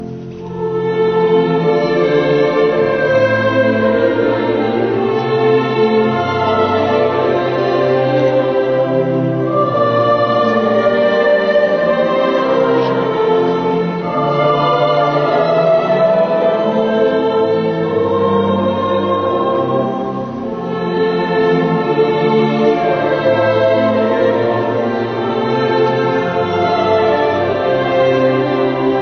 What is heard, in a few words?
Nuns Chorus